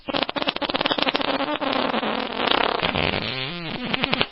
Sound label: fart long